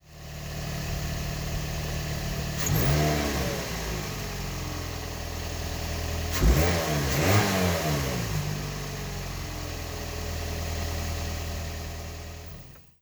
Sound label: revving